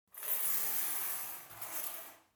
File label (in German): Schluesselbund schieben